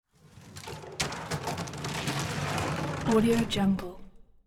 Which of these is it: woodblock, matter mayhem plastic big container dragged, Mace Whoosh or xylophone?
matter mayhem plastic big container dragged